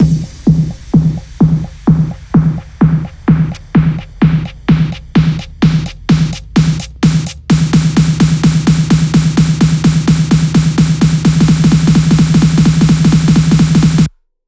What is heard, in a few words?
128BPM Stomach Pumper, House Electro Fills; 8Bars; Vengeance Samples